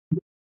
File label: notification